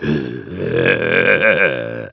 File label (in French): rire; sadique